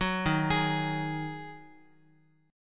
Eb Minor Double Flat 5th, down, Guitar; arpeggiated; chord; 9,11,11,x,x,x; JGuitar

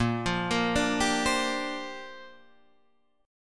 Bb 6th Add 9th Bb Guitar Open D

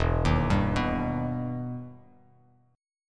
E Minor Major 7th E down Bass Standard; EmM7 arpeggiated

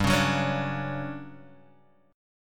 D-Major 7th G; DM7/G Chord {3 4 4 6 3 X} strummed

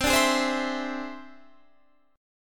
E Augmented 7th C

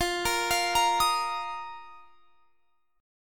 F 7th Suspended 4th F down; arpeggiated

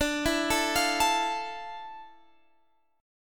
F Major 7th, D; Fmaj7/D; arpeggiated

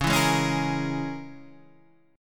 Db Minor 6th, Guitar Half Step Down; Dbm6 strummed; JGuitar

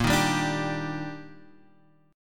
Eb Major 7th Bb

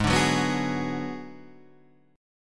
D 6th Flat 5th Ab

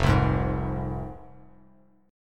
F Minor F down Bass Standard; Fm strummed; JGuitar

